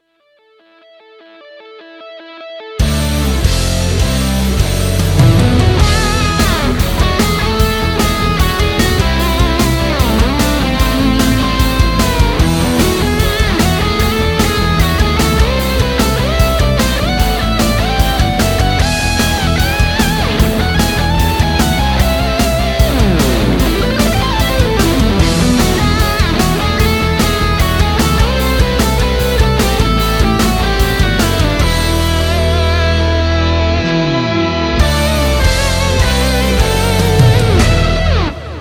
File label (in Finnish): riffi skaba